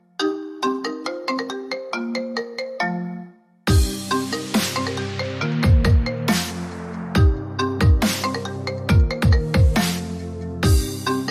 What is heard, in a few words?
iPhone Metronome